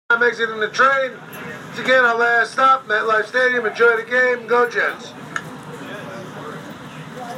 arriving at stadium; mlstadium nyjets

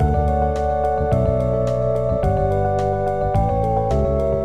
midi sequence

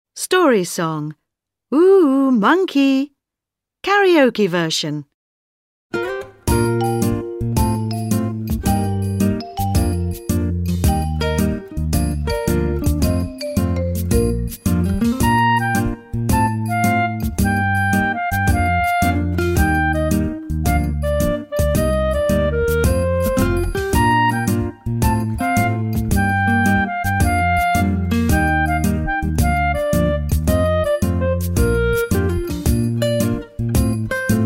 ooh ooh monkey karaoke version

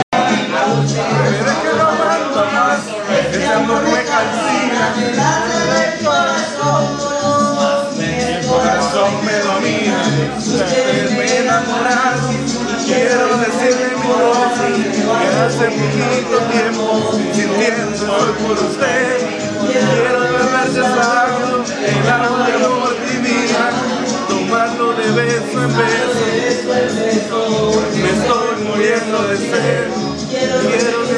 back up vocals karaoke; hombres lounge

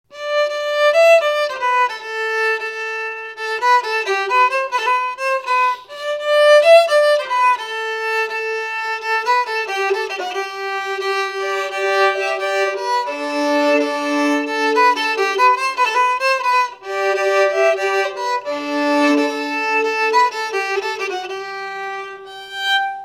Medieval Fyddle La Uitime Estampie; “La Uitime Estampie Reel” from the 13th Century French song book “Chansonnier du Roi”

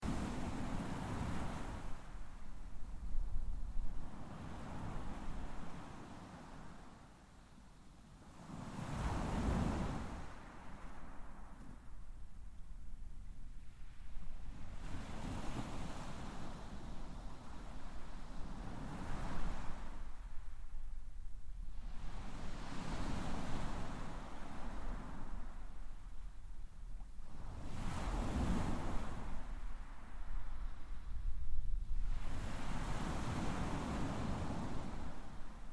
waves on beach; ringstead beach

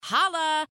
sigh

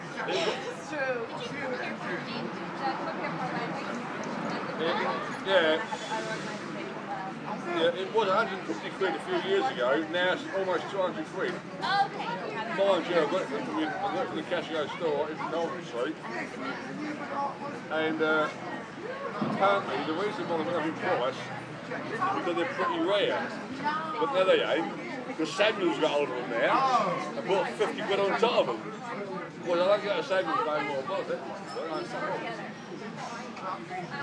pub

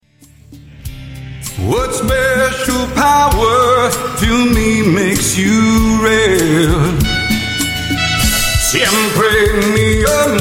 singing

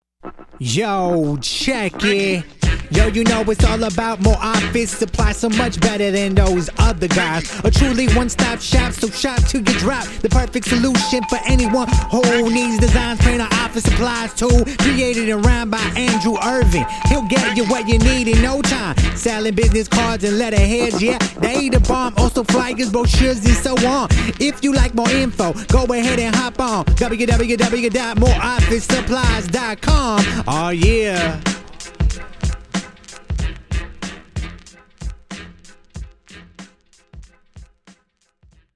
office supplies rap

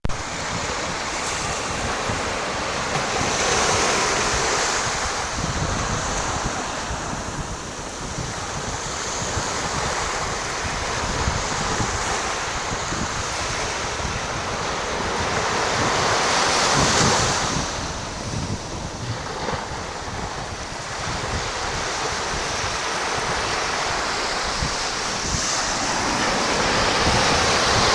waves at ravensheugh beach tyninghame

